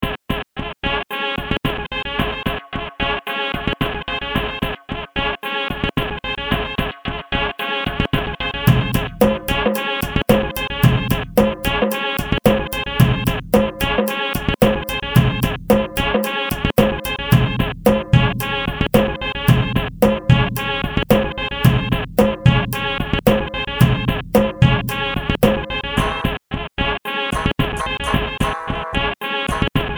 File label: Organ Trumpet Remix